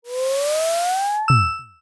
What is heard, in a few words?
radar sweep return